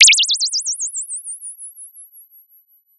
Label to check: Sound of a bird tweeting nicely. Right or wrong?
Right